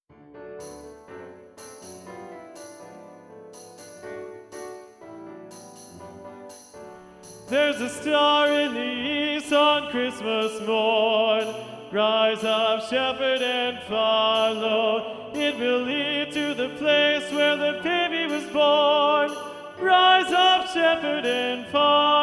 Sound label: tenor predominant